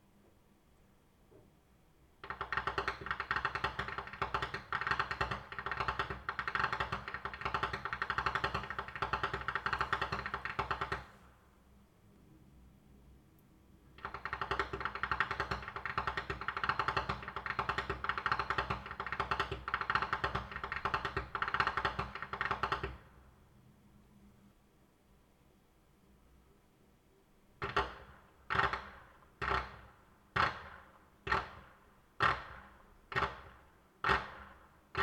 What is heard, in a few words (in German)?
schnalzen; saaldorf